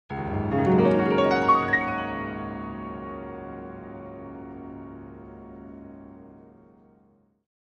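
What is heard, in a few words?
Grand Piano Black Keys; keyboards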